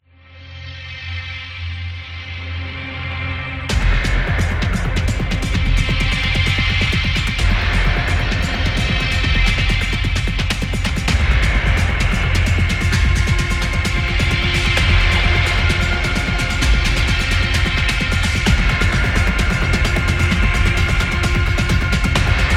Suspenseful

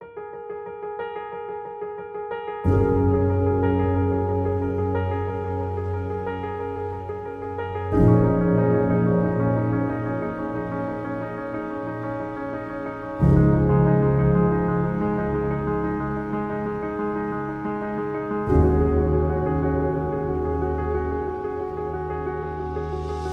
Reflective

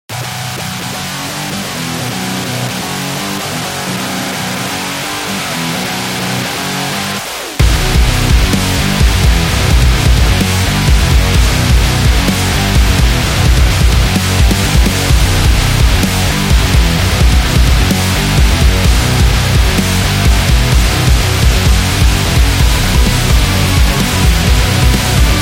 Nu Metal

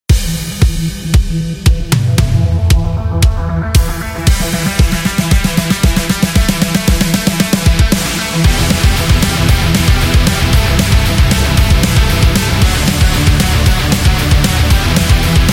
Powerful Heavy Metal

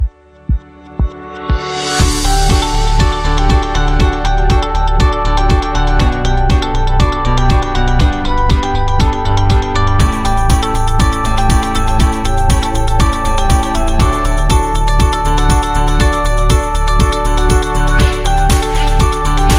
On Hold Music

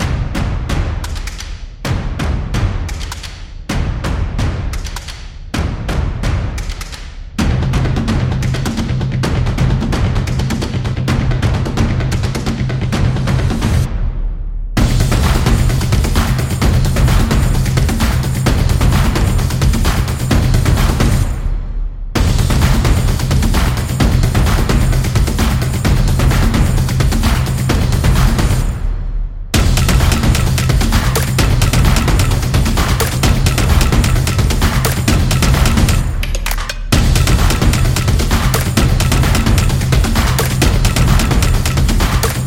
Stomp Intro; sports beat

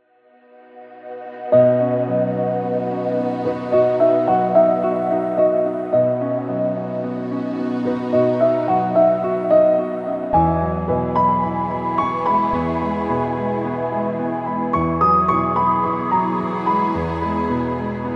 Happy Wedding; corporate background

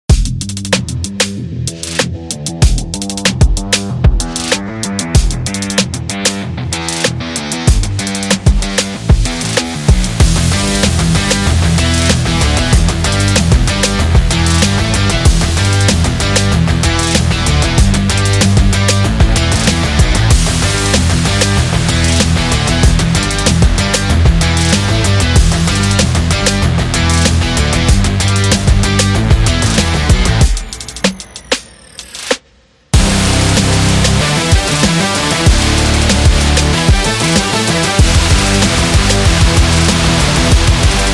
Rock Beat

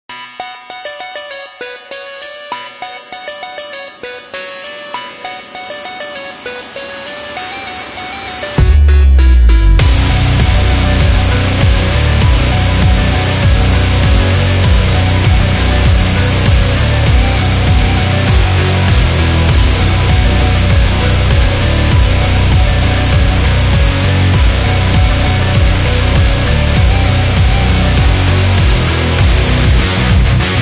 Game Metal

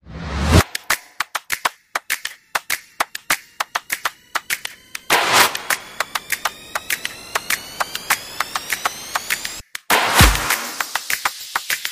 Percussion Opener

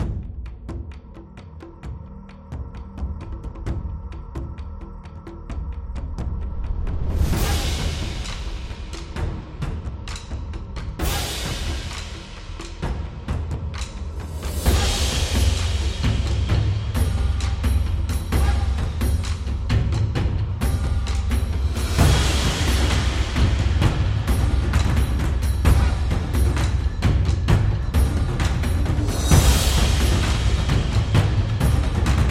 Japan Taiko

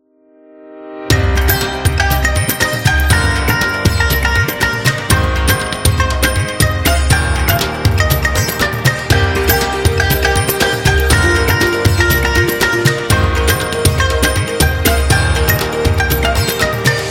Indian Folk

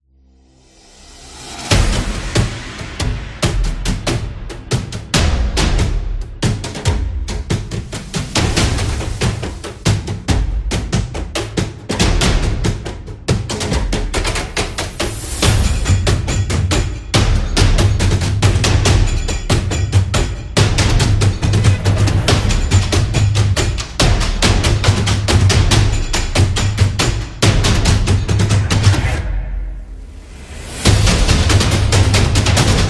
African Drum

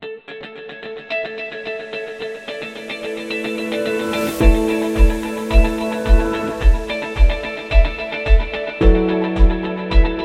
Soft Ambient Music; wellness

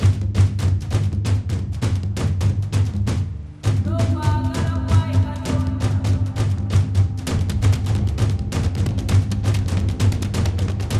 Africa Drums

